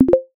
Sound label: chat alert